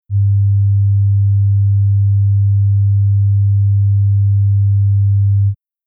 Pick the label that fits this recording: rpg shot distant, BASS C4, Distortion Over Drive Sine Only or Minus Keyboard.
Distortion Over Drive Sine Only